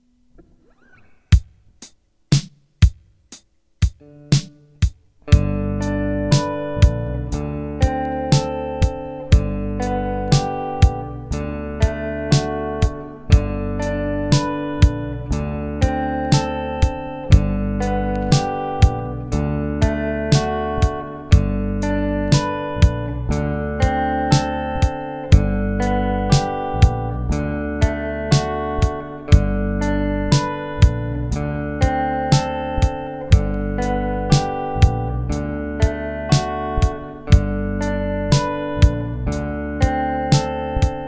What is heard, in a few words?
G D Chord Melody